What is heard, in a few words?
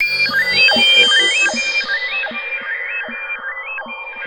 G3 REWIND; NorthStar - The Wizard VOL-2